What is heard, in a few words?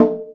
Conga Hi Open; GMPercussion; 3DO; Toolkit 1.5; GMPercussion22k